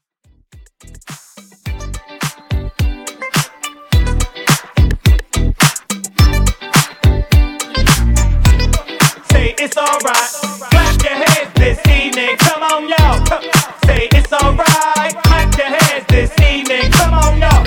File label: hype Extended; Redrum